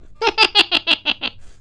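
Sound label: funny laugh; funnylaff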